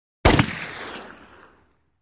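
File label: 357 Magnum Pistol Shot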